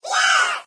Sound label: win